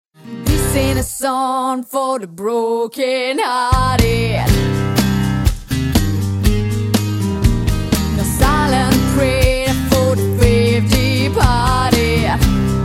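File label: vocal, Russian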